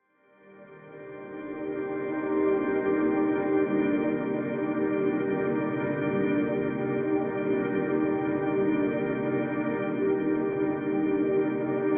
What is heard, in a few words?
Long Ambient Pad